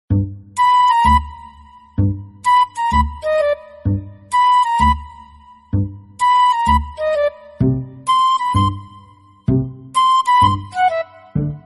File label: funny background music